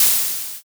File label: spray